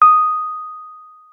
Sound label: WURLITZER, D#6; Xsample VOL-1; 90 s Sample CDs; WURLITZER RS